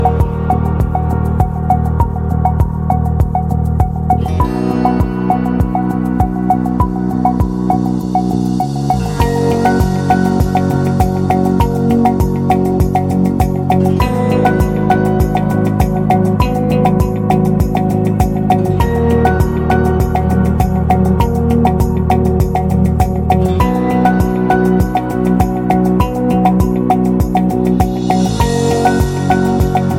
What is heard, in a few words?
Calm Background; MIUI12